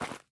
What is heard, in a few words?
Footstep Dirt